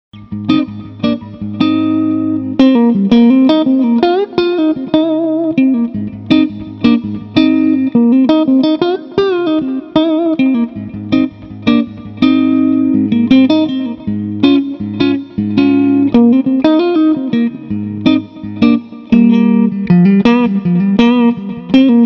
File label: strat middle bridge clean